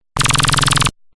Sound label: Laser Like Synth Rapid Laser; sound spark